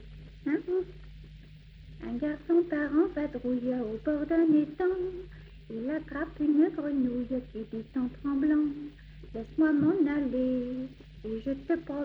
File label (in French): grenouille